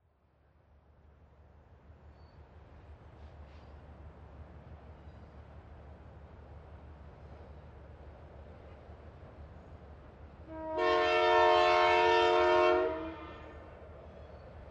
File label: Train Passing with Horn